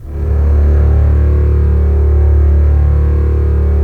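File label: STR CBS, Strings; Roland - LA Composer vol. 4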